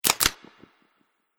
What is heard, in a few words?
Robot Clip Clop Feet; Robot Sound Effects; An Essential Sci-Fi Robot Sfx; Andrew Fleming